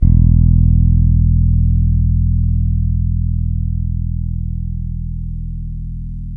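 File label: ballad, 6-string ballad, bass; Spectrasonics; Bass Legends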